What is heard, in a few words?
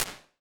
Modern Apartment, Impulse Responses; Small Spaces; Indoor Spaces; Apple